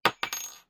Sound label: key; DungeonsOfKharadum